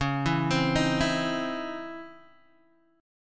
C sharp Minor Major 9th C sharp Guitar Open D, chord; 11,7,10,9,7,x